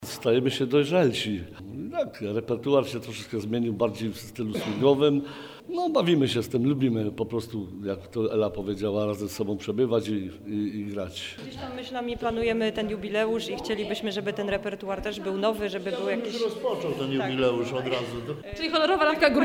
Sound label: brass